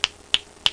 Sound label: finger snapping